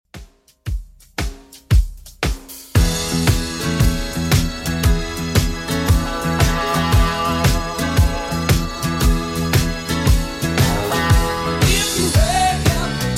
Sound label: 70s Redrum 115bpm